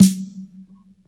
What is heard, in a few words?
fat snare one shot f sharp key